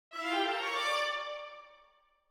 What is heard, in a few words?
Str Quartet INTRO; Factory